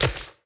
Fall; portal quest; android; Paladin Skill1

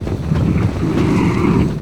Quake; Puzzle Chronicles; PS3; PSP; X360; SFX SPELLS

